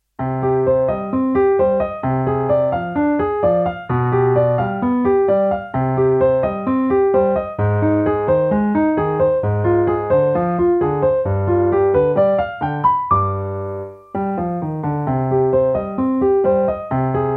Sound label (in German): Tango; inspiriert von Bach